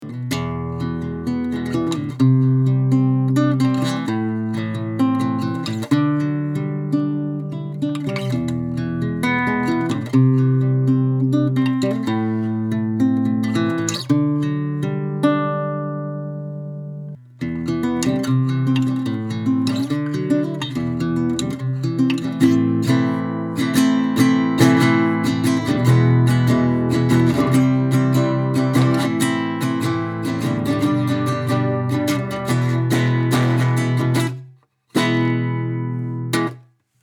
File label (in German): Rode NT USB Gitarre